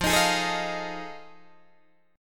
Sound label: F 7th Sharp 9th, Guitar, Open D